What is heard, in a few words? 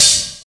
soft open hi hat sound d key